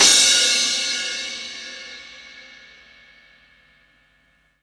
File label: CYMBAL, CRASH